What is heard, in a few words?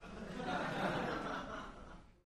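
Crowd 100 Short Light Medium, medium interior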